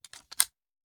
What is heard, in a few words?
Machine Gun Foley; Sound Ideas; Guns